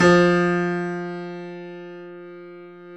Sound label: KEY F 2, YC7; Roland L-CD701